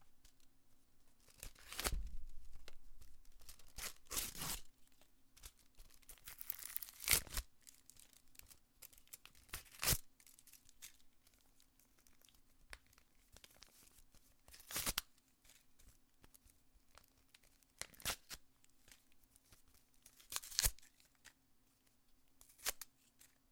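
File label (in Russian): разрывание конверта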